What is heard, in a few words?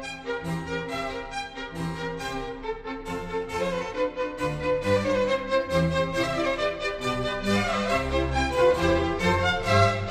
Vivaldi Gloria in D major, orchestra loop; Vivaldi Gloria in D major RV 589; state opera orchestra; ゆめ2っき